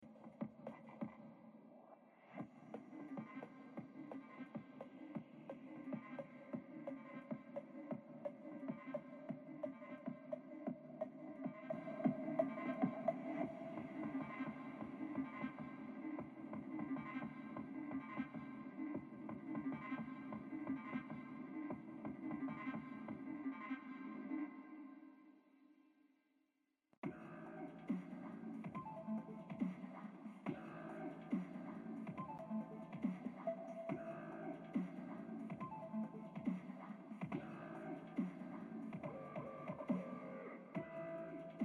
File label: camp night musik; Life is Strange 2; gamesoundopedia